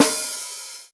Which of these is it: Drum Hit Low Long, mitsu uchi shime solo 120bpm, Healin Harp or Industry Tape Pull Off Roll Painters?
Drum Hit Low Long